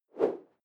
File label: whoosh simple short airy; swooshes-swishes